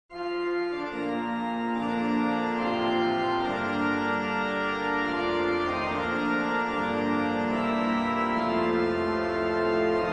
the star bangled banner trascrizione per grande organo